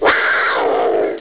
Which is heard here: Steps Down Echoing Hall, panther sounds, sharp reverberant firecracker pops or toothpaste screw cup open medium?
panther sounds